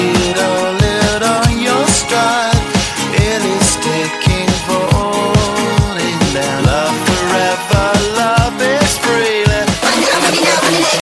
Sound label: feel good electro, ringtone